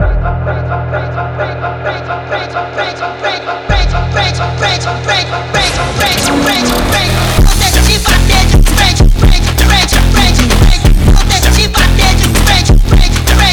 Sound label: Phonk Music; فانک phonk